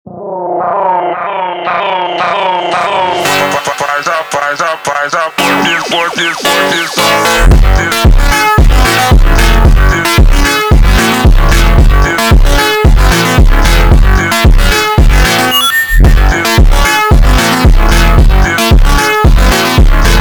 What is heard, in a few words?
Montagem slowed